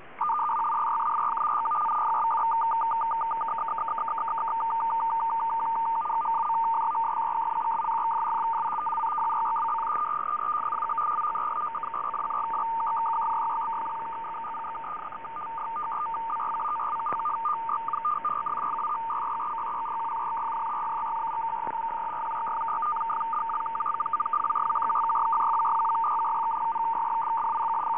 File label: YUG-MIL 120.9 Bd FEC-SYSTEM